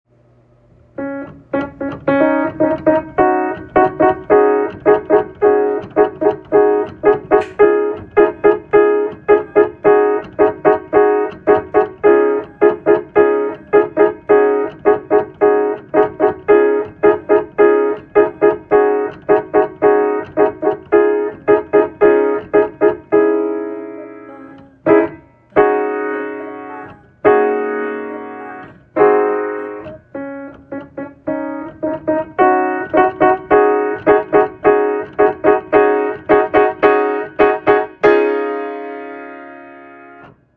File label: Fanfare Secondo; Fanfare by Vandall Secondo